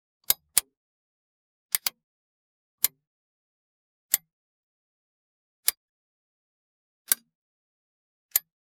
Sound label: tactical flashlight tail, switch